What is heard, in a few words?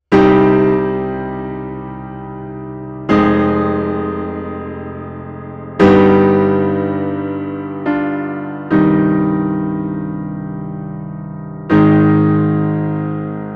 Open Lid Live Wide Stereo Loud Steinway D